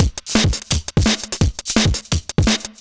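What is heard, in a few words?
Drum and Bass Beat 170, drumnbass 170bpm; musicradar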